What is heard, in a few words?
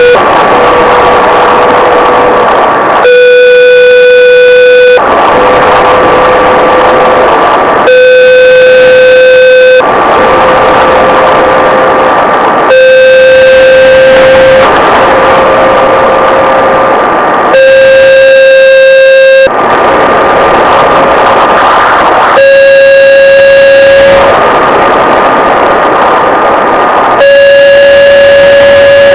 HAARP Moonbounce